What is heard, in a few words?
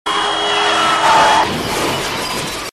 loud car crash